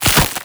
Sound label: foley, zombie revived; Half Life Alyx